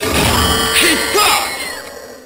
hit search

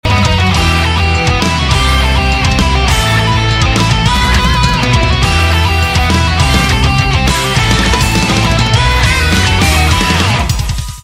intro music; pu shitcake

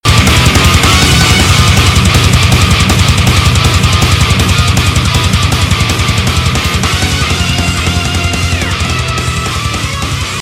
intro music; ff2

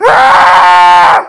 jump; freak fortress 2; sammy sonic fan

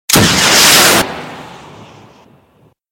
superjump; ff2; reaperz

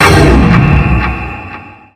edit impact; calamity

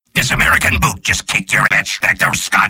kill; freak fortress 2; mad machine

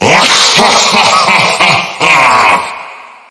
laugh; calamity

